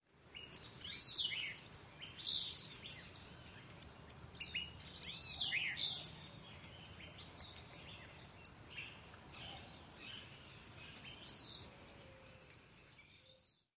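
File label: alarm chips, olive sparrow; TX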